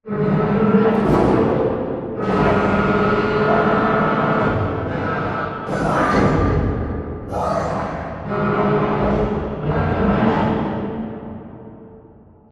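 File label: Assembly Wall Arm Distant; Boomtown; Titanfall 2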